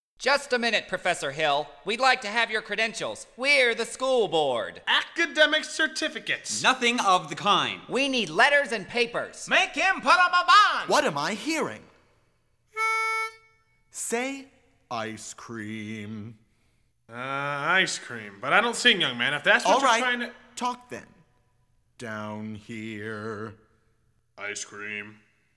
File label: Ice Cream Sincere W Vocals; music man jr rehearsal music